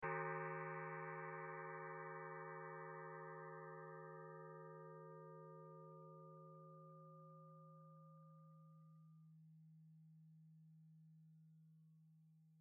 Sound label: gong